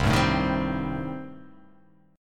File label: C Minor 6th, chord